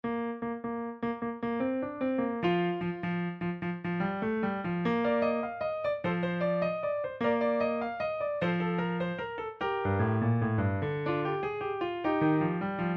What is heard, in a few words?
GLORIA IN EXCELSIS ACCOMP.